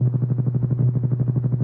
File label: hold loop